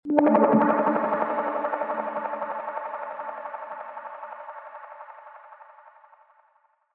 One Shot D

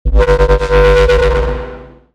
Foghorn G

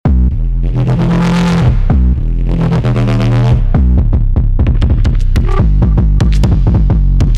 130 Bass Loop Dirt E min

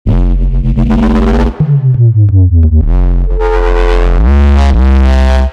174 Dirt D

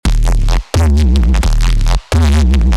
174 Dirt D

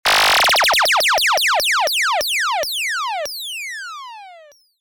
Fx Laser

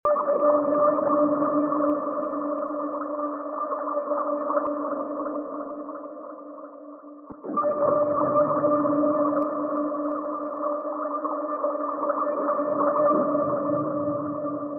Texture Dmin

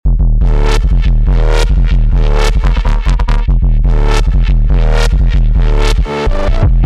140 Groove D

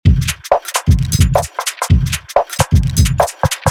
Kit 130 Full

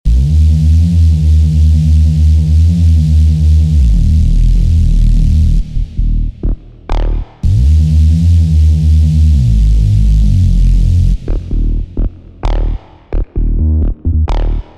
130 Bass Loop Dirt D Min

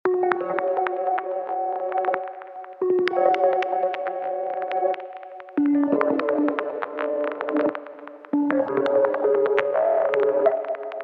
Pluck Fmin

